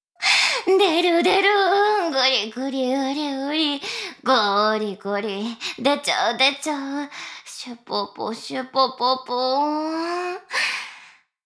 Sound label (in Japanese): しこしこ音声; 牧場戦腺; 少尉